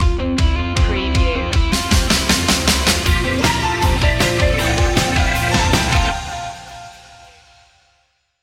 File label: indie rock party stinger